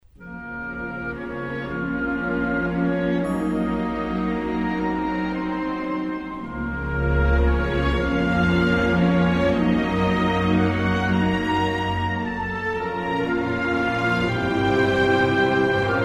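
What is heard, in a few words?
Storm In coming; Bestem tempo og taktart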